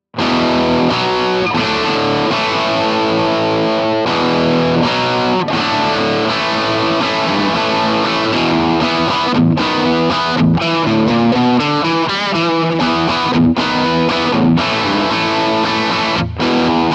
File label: rock chords; jensentone